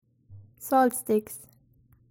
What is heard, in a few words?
salt sticks